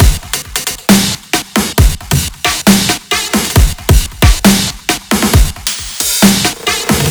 Delay Kit

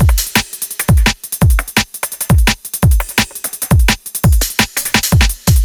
Future Beat, 170 bpm

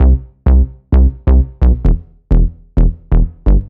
Bass, electric jazz

